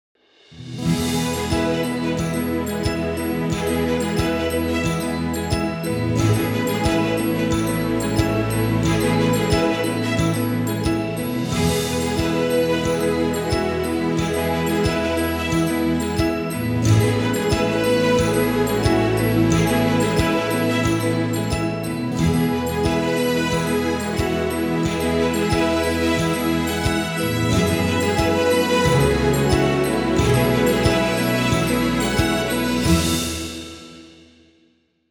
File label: Happy, Background Music